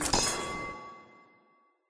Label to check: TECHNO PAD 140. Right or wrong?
Wrong